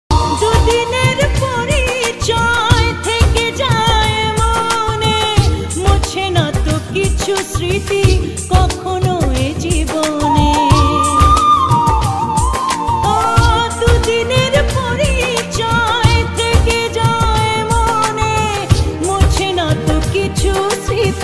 Very Sad Bengali Female Ringtone

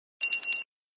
Tiny Bell Ringtone; ringtones

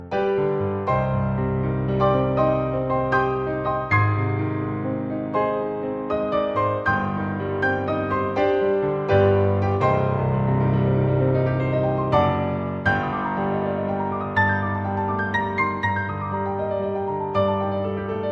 Theme Music Ringtone; Star Wars The Force

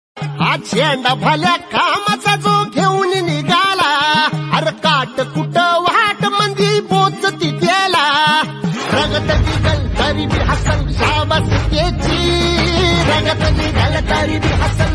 Marathi Song Ringtone

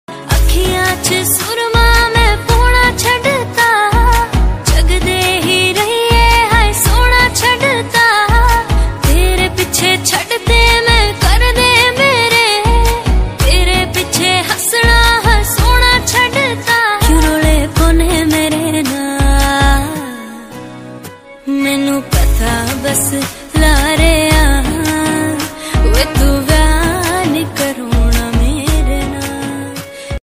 Female Version Song Ringtone

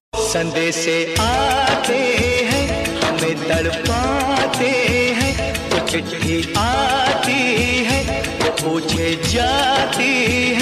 Desh Bhakti Song Ringtone

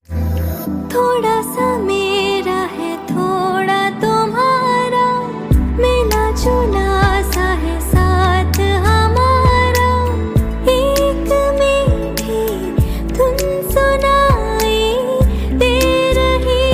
Female Ringtone

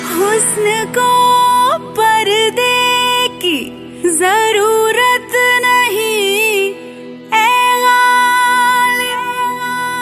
Romantic Hindi Female Ringtone

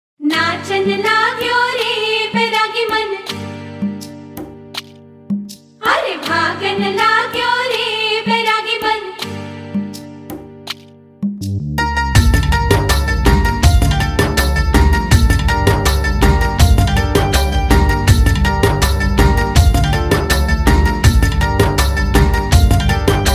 Meeting Music Ringtone

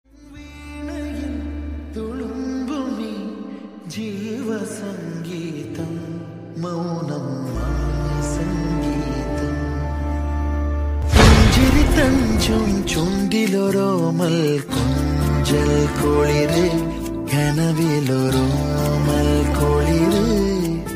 Malayalam Ringtone

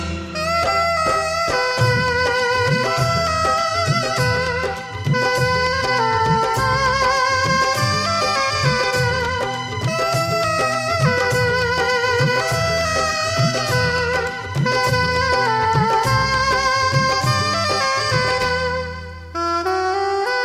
Instrumental Ringtone

